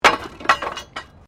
Wood Metal Fall; Material components for constructing more complex sounds; Materials

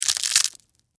Crunch Ramen Noodles; Texture; Crunch Sound Effects; Objects; Blast Wave

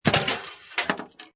Pinball Sound Ball Launched Slides And Clanks; Video Game Sound Effects

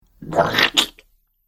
Arcade Game Slime Squelch; Video Game Sound Effects; Retro Inspired Sound Effects - Think Nintendo, Atari, C64, Etc.; Andrew Fleming

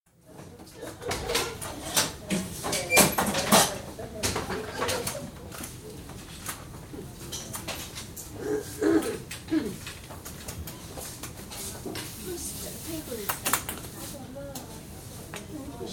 Womens' ward atmosphere; Hospital Sound Effects; BBC

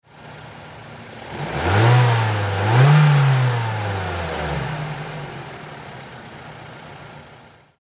Car Mazda 3 High Double Rev; Power Car; LYN110 package of Power Car sounds; Car Sound Effects

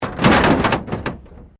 Wreck Door Panel Clunk Metal

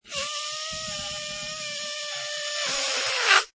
Cartoon Balloon Release Air, long air releas; Comedy/Cartoon, Balloon: Release Air, long air release; Cartoon Sound Design

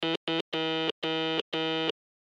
Morse Code 2 Number; Blastwave

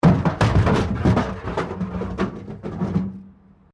METAL BIN SCRAPE PLASTIC CRASH BARRIER

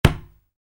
Soccer Ball: Kick Ball; Football; Sports; Soccer Sound Effects; Soccer (Sound Effects:Sports); Stuart Duffield

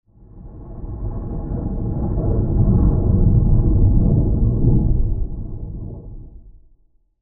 Diffuse And Low Transition; Scary Soundscapes

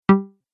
Bleep Button; multimedia

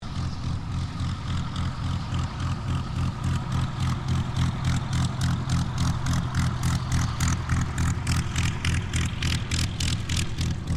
AIRCRAFT P-40F KITTYHAWK TAXI; 1942; P-40F Kittyhawk / Warhawk/Tomahawk; Airplanes (Sound Effects:Vehicles)

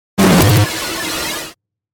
Blip Whammy Twinkle Glitch; Production Element Imaging Element Accent Transition; Beeps

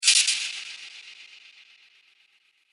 Production Element Chopper Burst Airy; Choppers; Sound Design; SFX Bible

